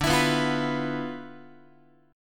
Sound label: D Minor 6th Add 9th down Guitar; Dm6add9 strummed; JGuitar